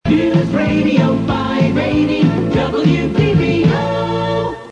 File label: News Radio 580 sung